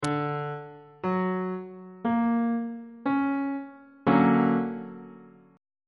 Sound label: c double sharp augmented major 7th chord on bass clef